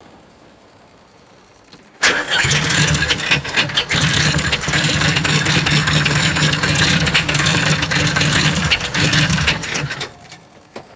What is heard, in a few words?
vintage heavy vehicle starter motor cranking